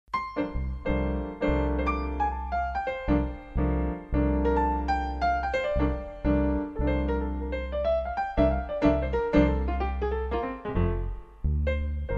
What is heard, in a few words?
Chamber Jazz